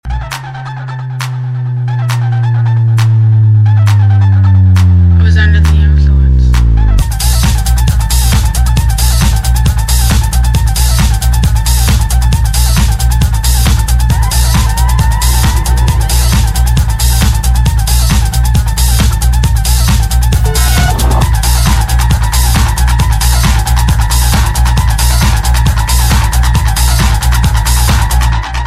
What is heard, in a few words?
Bass